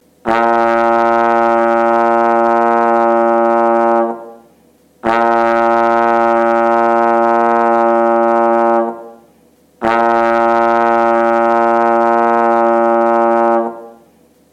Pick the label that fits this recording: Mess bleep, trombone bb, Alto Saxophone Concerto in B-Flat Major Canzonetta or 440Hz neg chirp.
trombone bb